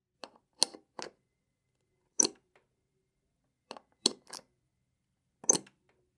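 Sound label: socket making sounds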